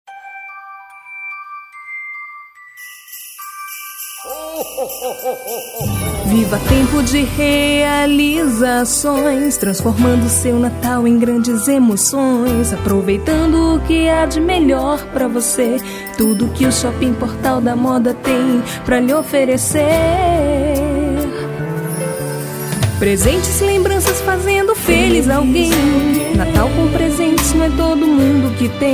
jingle shopping natal; portal da moda

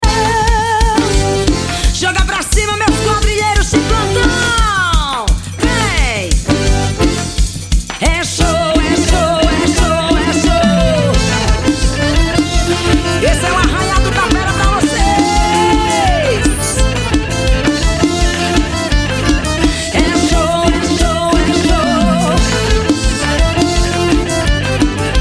arrasta pe, forro

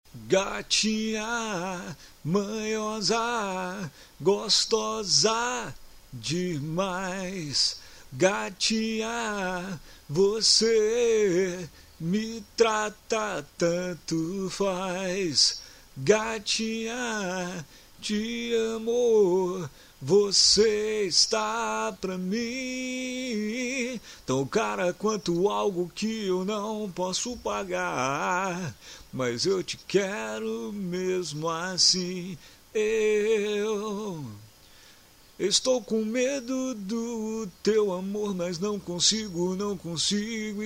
Funk Loco

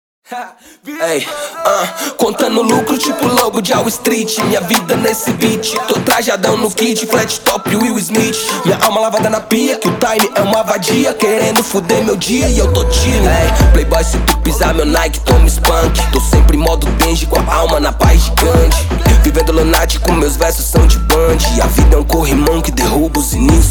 rap trap

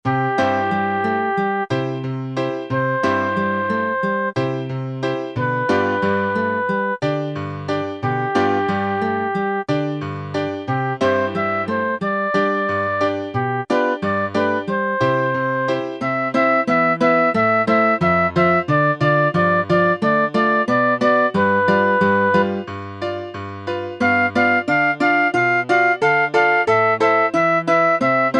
flautadoce

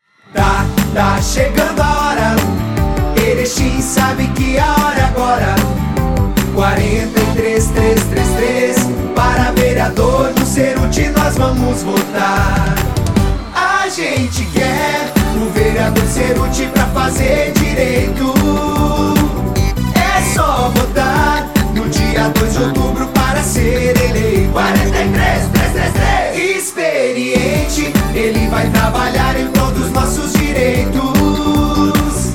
jingles politicos

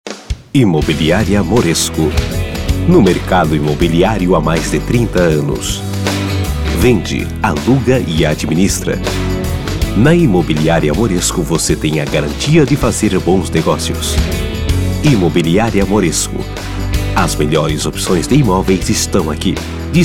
espera telefonica; Moresco Espera Telefônica

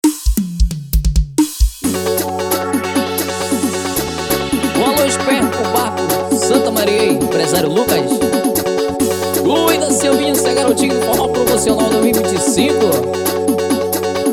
forro

